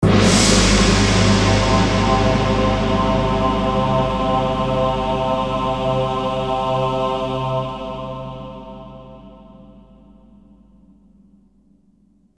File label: Orchestral Phrase; FXHome